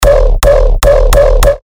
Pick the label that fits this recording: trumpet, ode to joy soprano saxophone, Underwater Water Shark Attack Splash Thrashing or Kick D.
Kick D